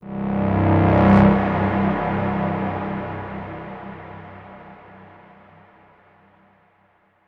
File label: Stinger Combat Tone